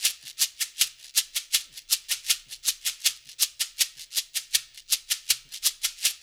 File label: LOOP, SHAKERS, Percussion